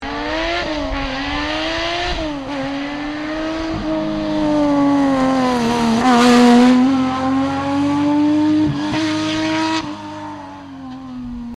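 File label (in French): porsche, rallyes; Porsche Godie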